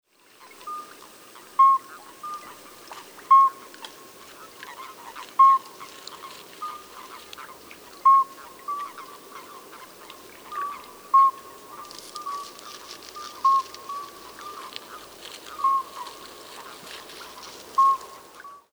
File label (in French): Alyte accoucheur